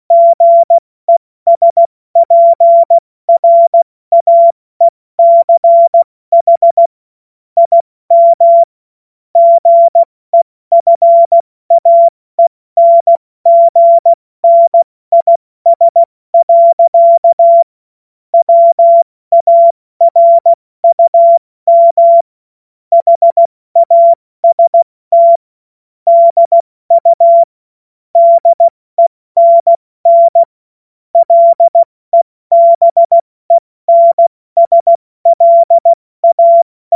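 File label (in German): Witz, Morsekurs, cw morsen; Witze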